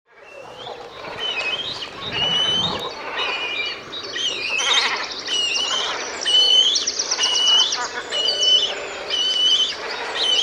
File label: Cormorant Sound